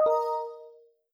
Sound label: Windows Error